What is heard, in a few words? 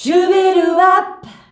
"SHUBIWAP" G, FEMALES